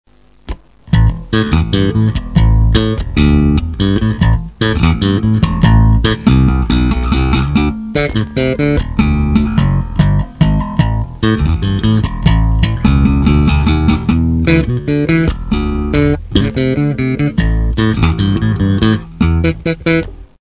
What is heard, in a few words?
Bassgroove